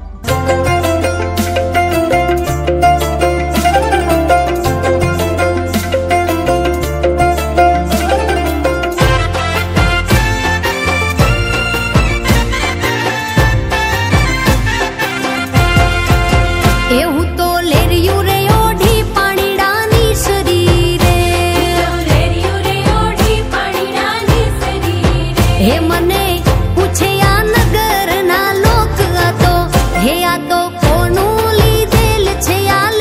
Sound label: Gujarati Folk Song